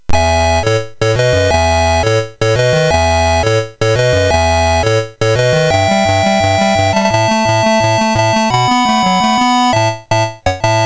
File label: Slap Fight, MOS6581R3; hvsc